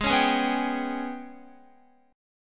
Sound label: Ab-Minor Major 7th Double Flat 5th, down, Guitar; AbmM7bb5; strummed